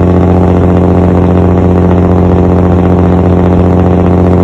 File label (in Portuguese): motor mb1113